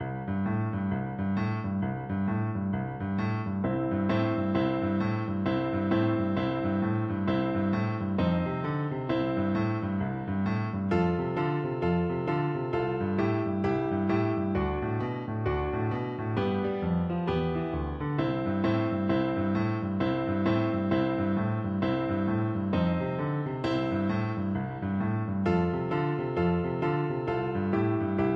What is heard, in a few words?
jingle bells boogie FL kar, Flute